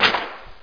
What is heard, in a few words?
flex whp